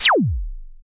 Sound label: FX A, ANALOG; Sound & Vision - Gigapack II